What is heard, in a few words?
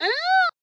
formant filter vox hit, Formant Filter Synthesized Voice Hits